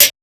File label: Hats, Alesis Performance Pad